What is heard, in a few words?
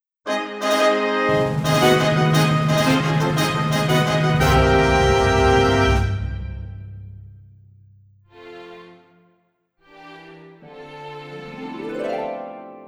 Accompaniment Backing Tracks; COWARDLY LION AUDITION